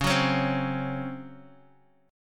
Db Major 7th Suspended 4th Db down Guitar DADGAD

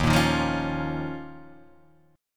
D sharp 7th Suspended 2nd

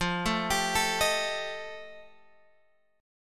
F 9th F Guitar Open G, chord

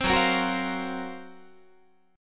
Gb Major 7th Flat 5th Guitar

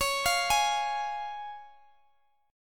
Db Major Db down Guitar DADGAD

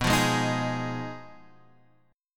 B 6th Flat 5th B, Guitar, Standard; B6b5 strummed; chord shape; JGuitar

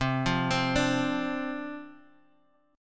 D-Minor 7th C, chord

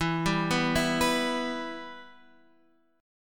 E Minor E, Guitar, Half Step Down

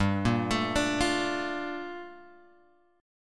G Minor 9th, Guitar; Gm9 Chord {3 1 X 2 3 1} arpeggiated

